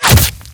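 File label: assassinate damage, sniper, weapons; assasinate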